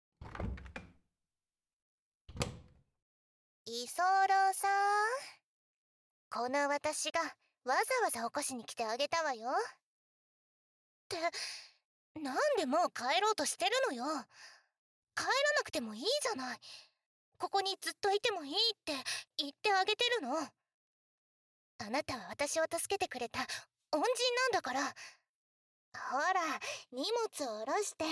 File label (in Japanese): ツンデレ姫のご褒美ねっとり耳舐め; ツンデレな褐色エルフ姫